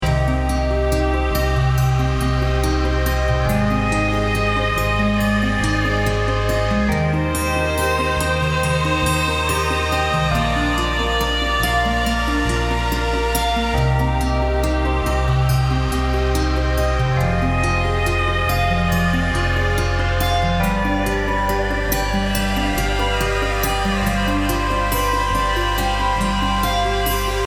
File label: Healing Melody